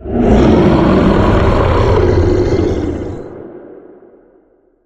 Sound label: giant underground